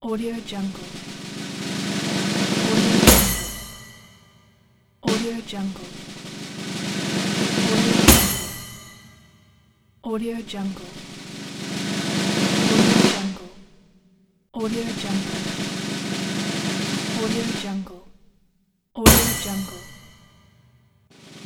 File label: snare roll